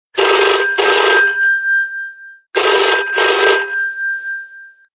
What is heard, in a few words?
classic ring